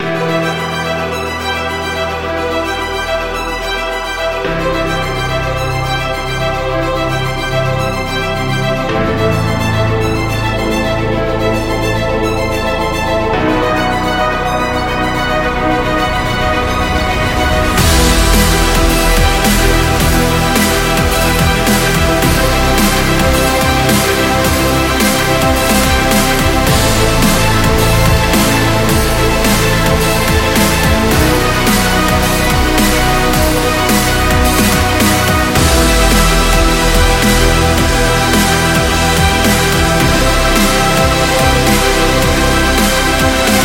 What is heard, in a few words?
Epic Dubstep Drama; epic music vol 2